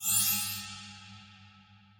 splash Chests Appear; wild bazaar

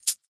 chest Hover Over; fairymirror

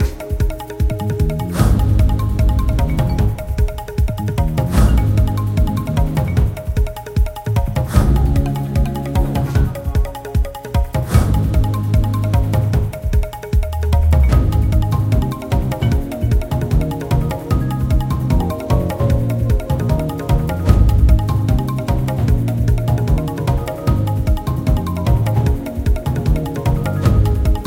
music main game night; serengetikings

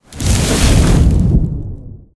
jungle out; silverback

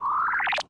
Counter Stop; knight rider

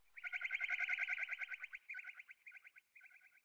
animals zebra; serengetikings

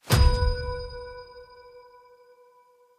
transform Blink; finn

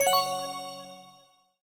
nano Win Count Up; fireflies

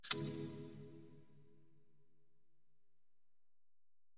spin Button Quick Stop; fairyhansel

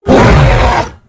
gorilla; silverback

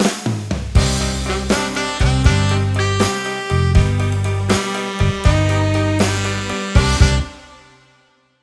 intro Free Spin; jackhammer 2

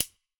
reel Stop; dead or alive 2

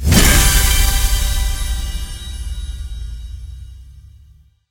scatter Hit; football

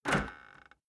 spin Button Click; mythic maiden

